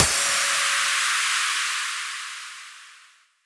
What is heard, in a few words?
Impacts, Effects